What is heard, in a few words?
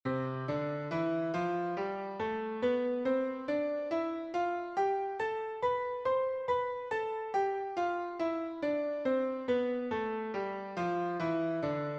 Scale C Two Octaves